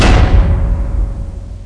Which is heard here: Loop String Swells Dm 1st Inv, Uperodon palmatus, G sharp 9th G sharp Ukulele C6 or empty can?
empty can